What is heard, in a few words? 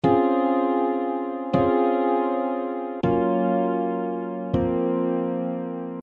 80 bpm epiano